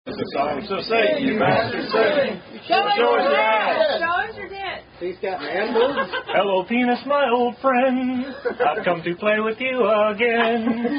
We Call On The Bastard To Sing Us A Song, Down-Down Song